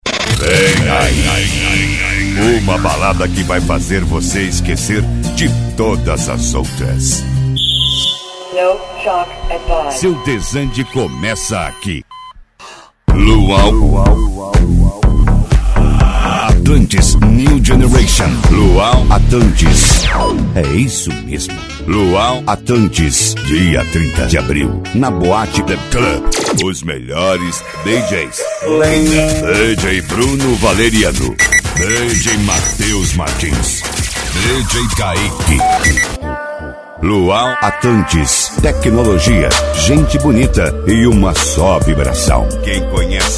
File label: funk; cd ferragens araujo